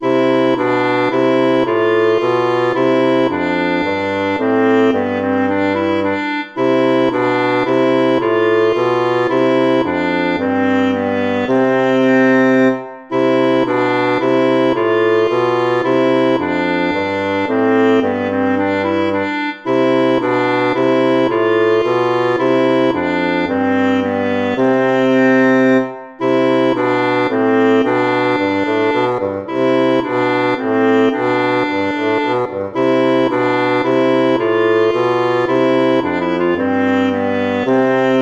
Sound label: tempo minuetto; hook james